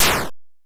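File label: Soft explosion; Tempest 4000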